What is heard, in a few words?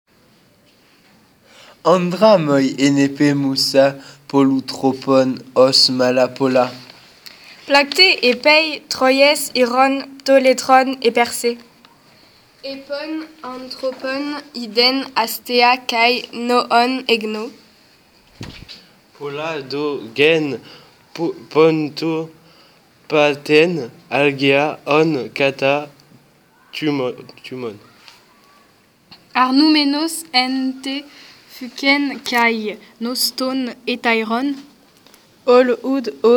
vocal